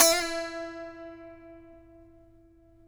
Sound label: SAZ; Roland - LA Composer vol. 3